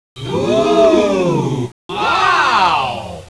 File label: voices wooo wow